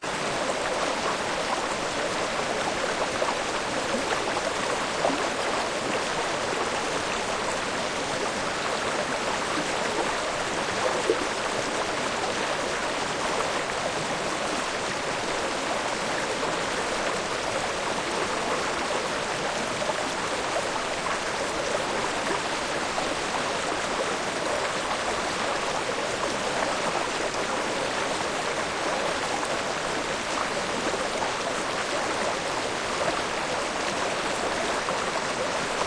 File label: River; fa battlemaps